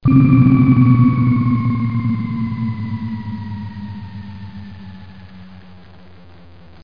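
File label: TUBE SLIDE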